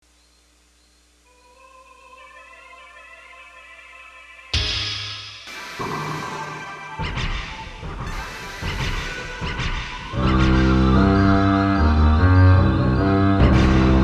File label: Cicada Song